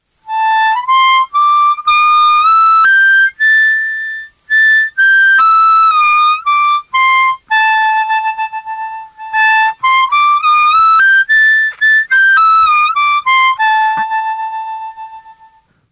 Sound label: blues pent, garmoshki